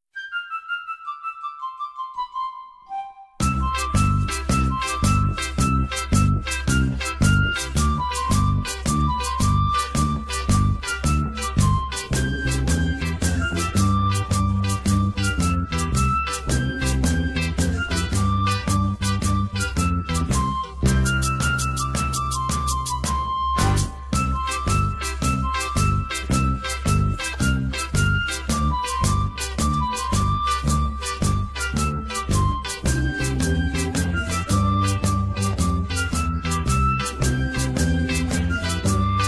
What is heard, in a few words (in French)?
CHANSON SEZON instrumentale